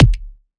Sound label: Kick; Ableton Live 12 Lite Core Library